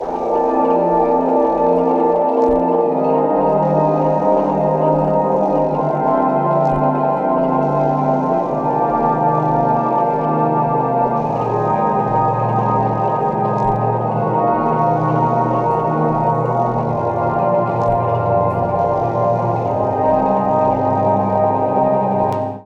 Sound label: polychords pad